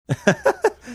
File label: cute laugh